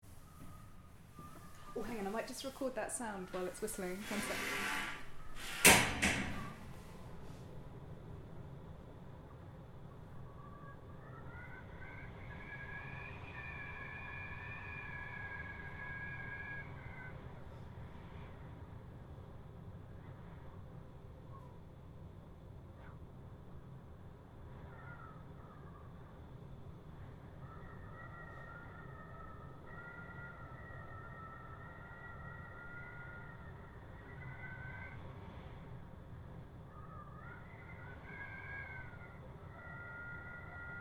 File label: Whistling in Lighthouse; Field Recordings